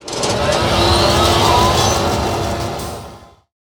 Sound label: Bumper Car